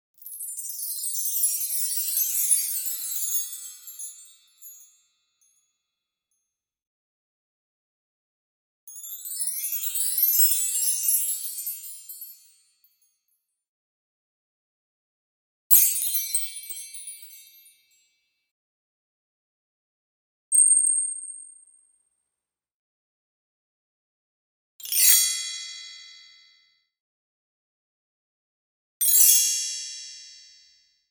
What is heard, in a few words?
Wind China Chimes